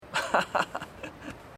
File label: ehhahaha